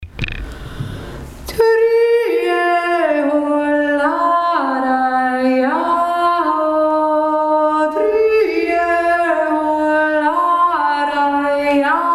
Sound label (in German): jodler 1 stimme langsam; 1. Prima voce lenta, da imparare; Dri e hol la rei ja ho, dri e hol la rei ja ho